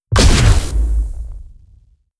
dragon wing attack; Stellaris; guardians

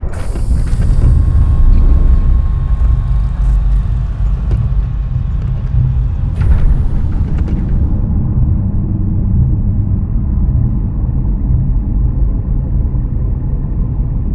arthropoid colossus closing; apocalypse

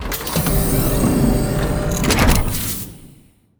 door opening; ancient relics

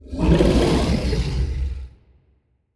kaiju grow up; biogenesis

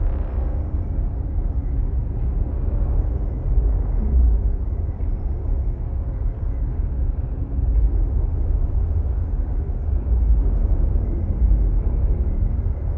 ship battleship idle; Stellaris; shroud; ships

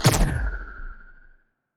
healing projectile, weapons; Stellaris; biogenesis

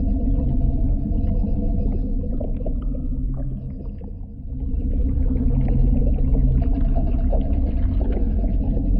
void worms nymph idle; Stellaris; grand archive; ships

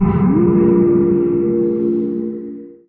construction complete; Stellaris

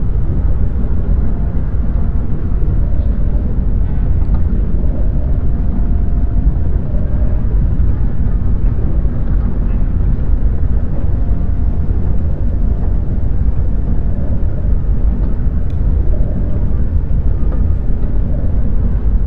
mammalian colossus idle; apocalypse

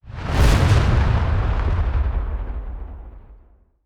kaiju titan muzzle fuel explosion; Stellaris; biogenesis; weapons

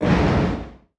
distance kinectic artillery hit; weapons; Stellaris

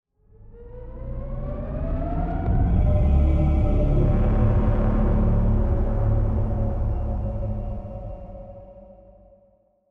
ship battleship move; Stellaris; shroud; ships

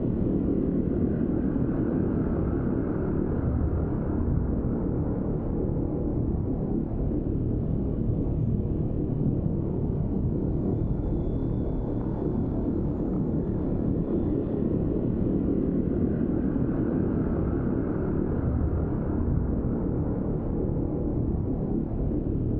ship cruiser idle; Stellaris; machineage; ships

